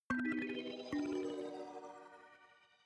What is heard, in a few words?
notification kalimba magical third up